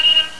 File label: Dog Horn